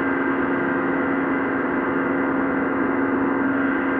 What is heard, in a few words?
sparse soundscape samples; ReLooped